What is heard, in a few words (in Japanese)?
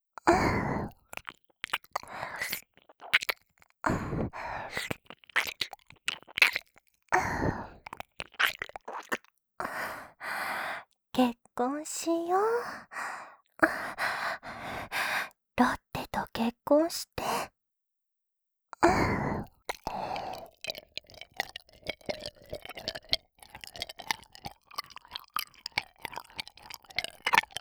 耳舐め; シャルロッテ